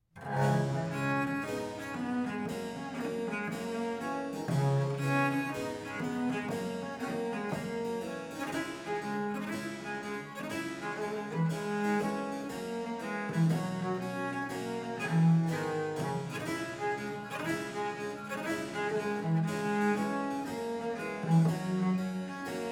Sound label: English Country Dance - Traditional